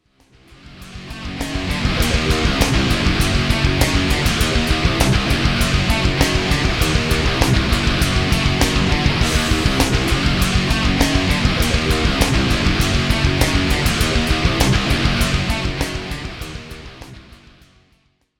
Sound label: Boost; Fortin